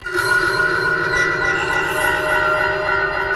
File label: Monstrum Harp, Electronica Tonal